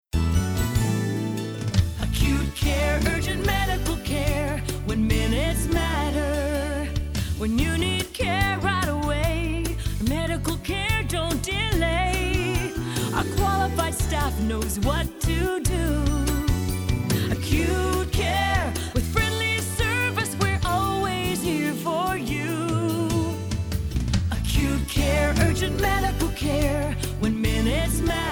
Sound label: hospital jingles; Acute Care